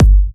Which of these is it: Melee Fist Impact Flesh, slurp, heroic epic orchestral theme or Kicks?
Kicks